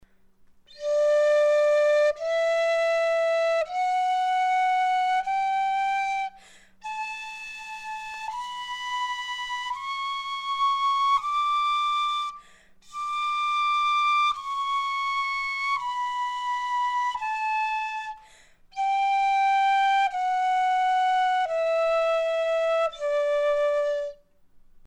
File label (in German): Pikkolofloete; Piccoloflöte